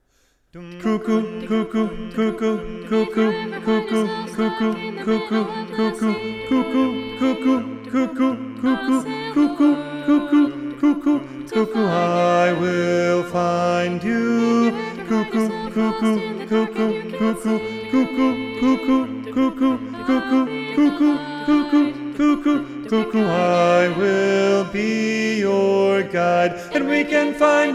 TENOR SATB Tenor Predominant

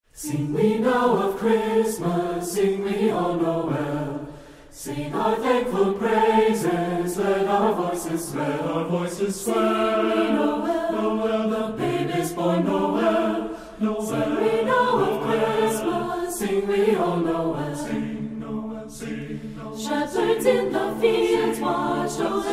SATB Choir; Sing We Now of Christmas

